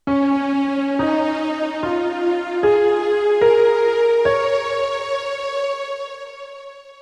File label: bhoopali aaroh, raag